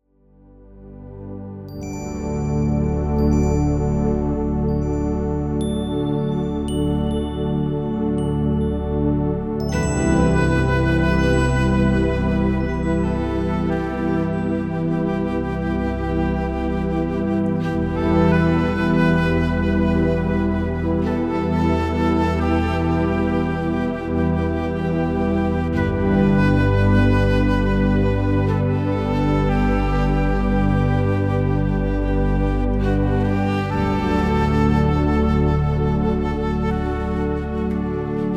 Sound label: Mystical Bells